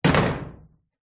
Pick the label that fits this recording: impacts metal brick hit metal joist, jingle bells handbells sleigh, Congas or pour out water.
impacts metal brick hit metal joist